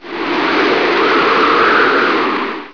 cyclone; blobwars

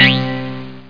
Button

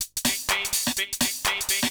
PERCLOOP HOUSE 125; eJay

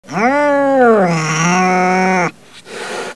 cat growl; GEOGRAFIA

